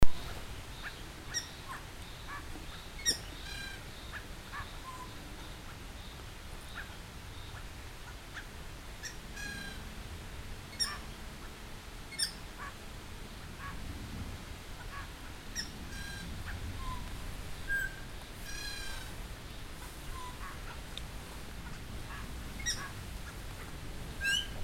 laughingthrush buffy Garrulax berthemyi

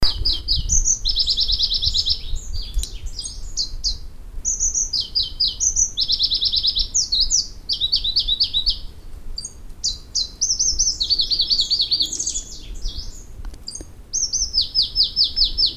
warbler pallas leaf Phylloscopus proregulus

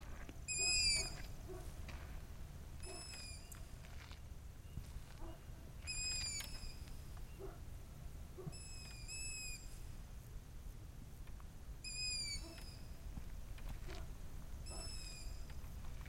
owl long eared Asio otus otus

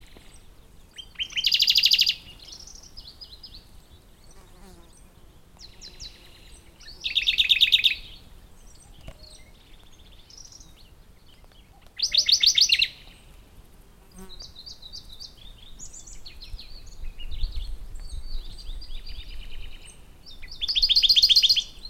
warbler radde Phylloscopus schwarzi

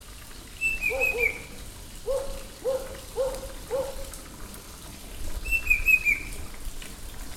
cuckoo banded bay Cacomantis sonneratii

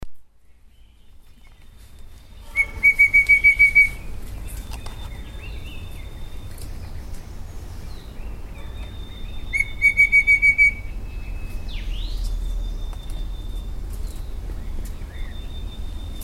babbler rufous capped Stachyridopsis ruficeps

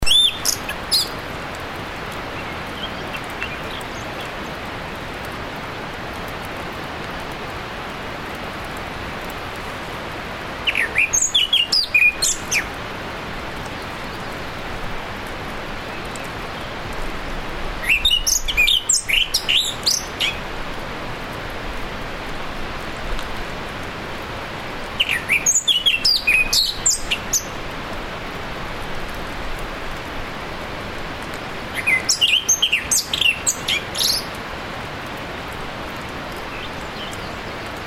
thrush himalayan forest Zoothera salimalii

